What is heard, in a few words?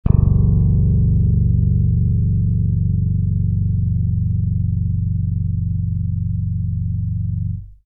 basslab 23hz